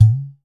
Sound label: udu; Voice Crystal - Steve Reid Definitive Percussion